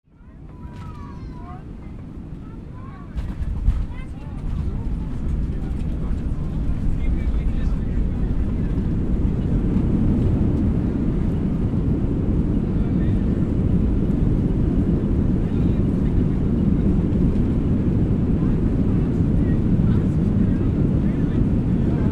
Airplane landing sequence

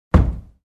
Heavy wooden object putting down on the ground

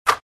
Basketball net swish

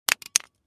Lighter drop on solid surface

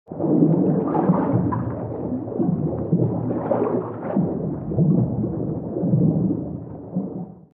Large aquatic dinosaur underwater movement

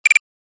Military radar antenna scan beeps; Military Equipment

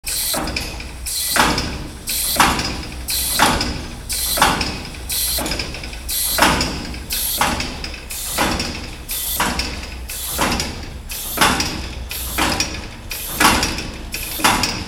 Machine room ambience machinery movement loop

